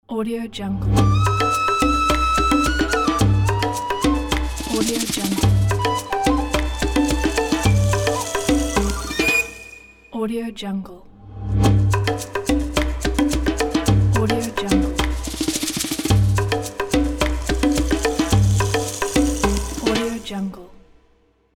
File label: jungle logo